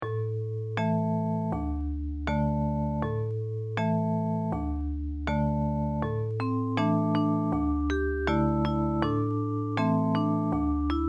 japanese folk song; yometori uta

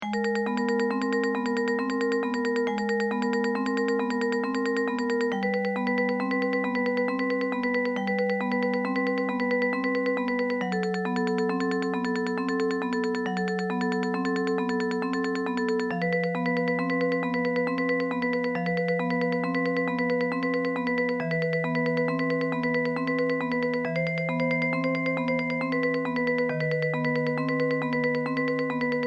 tremolo pieces in the fog

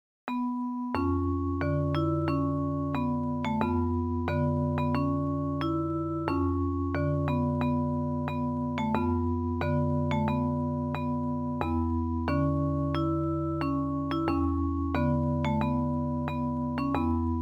folk song of kyoto; okage odori

